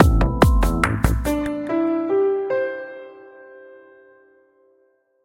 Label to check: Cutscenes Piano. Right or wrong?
Right